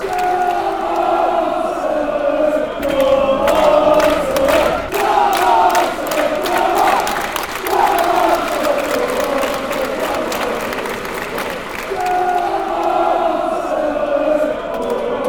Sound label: come, chants; silkmen